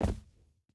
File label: footstep hide concrete jump land; Rust